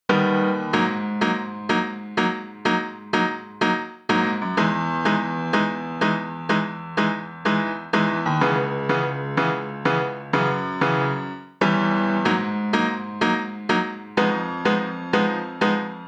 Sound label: piano comp